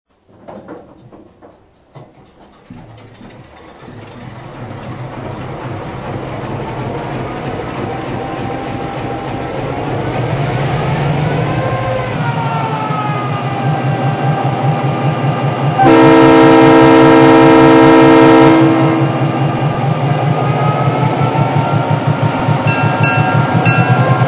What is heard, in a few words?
EMD GP-7